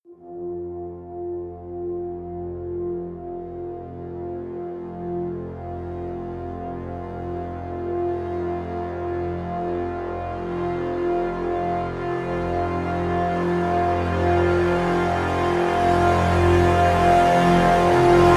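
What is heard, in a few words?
crescendo brass swell F; brand x music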